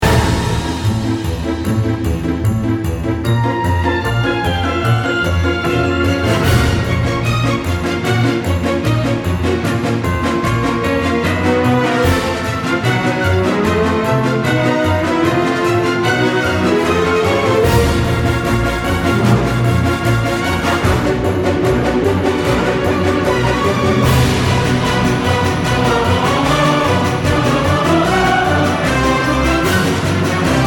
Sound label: cirque loop; alice in wonderland